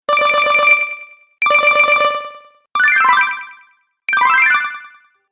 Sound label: Game Echo Bonus Pack, Video Game Sound Effects